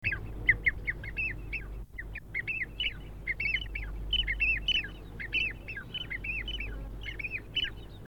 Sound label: scleroptila levaillantii, birds calls; phasianidae